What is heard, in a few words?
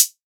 hihat loose feel, Closed Hats